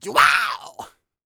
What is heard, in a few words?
A YELL, MALE HUMAN; R&B & Dance; ILIO; Vocal Planet